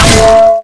melee frying pan; weapons

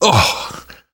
hurt minor, survivor voice; namvet; Left 4 Dead 2; left4dead2 dlc2

